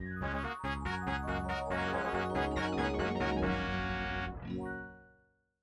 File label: Fanfare, Arachno; Apogee; Blake Stone Aliens of Gold; MS-DOS